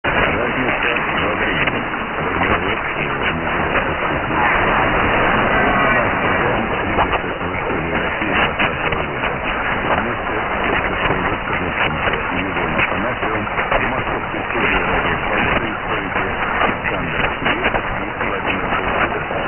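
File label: Lithuania ID, radio; RFE RL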